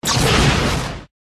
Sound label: Light Spirit Wound